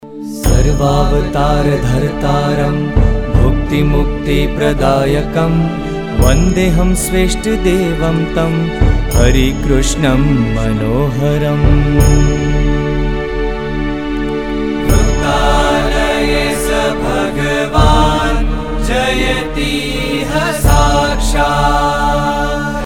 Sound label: Stuti Shlok